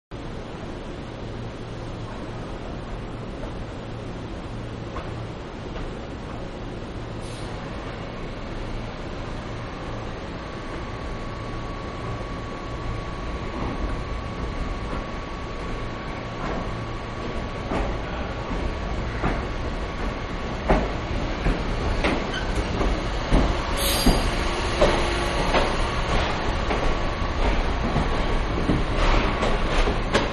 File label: Diesel Train Arrival People, Train Railroad Arrival People Leaving; Trains (Sound Effects:Vehicles)